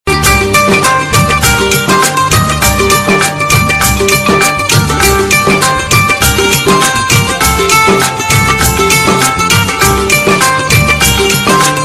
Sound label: mandolin tone ringtone; bollywood